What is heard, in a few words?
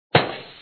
shoot; paint makers